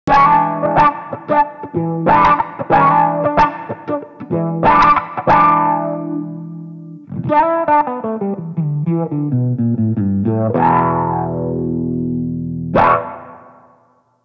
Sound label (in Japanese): Clean Attack 0